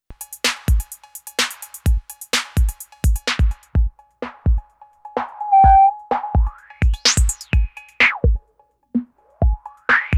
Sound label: external signal filtering; MFB nanozwerg